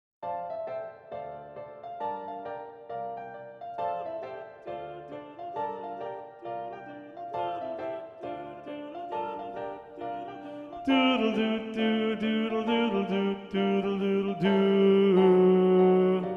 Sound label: Bajos; bandas sonoras